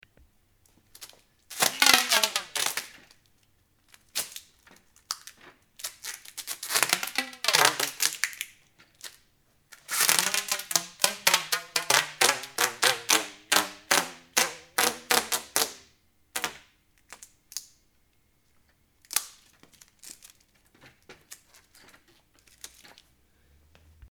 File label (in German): Klebeband